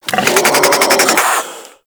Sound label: Infested Charger Idle, Enemies; Warframe